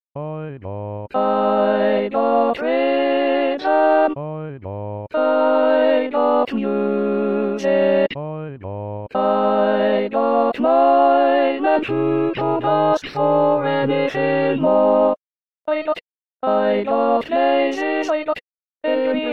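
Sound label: - Alto 1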